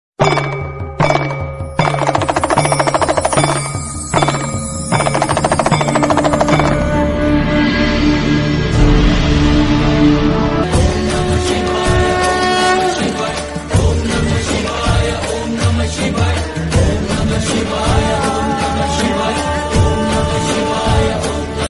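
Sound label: damru tone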